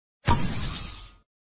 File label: shoot